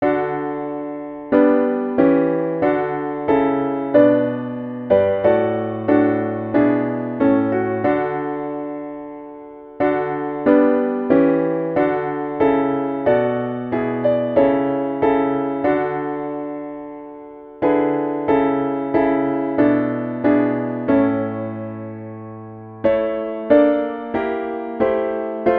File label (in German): Alle Stimmen Klavier; Crossing the bar; Alfred,Lord Tennyson